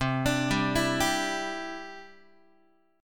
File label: C Major Add 9th, Guitar; arpeggiated; JGuitar